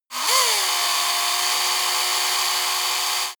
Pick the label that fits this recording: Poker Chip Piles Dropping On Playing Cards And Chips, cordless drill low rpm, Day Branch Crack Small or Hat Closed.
cordless drill low rpm